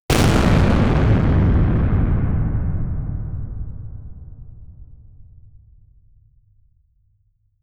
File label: the deep boom of a cannon firing in a battle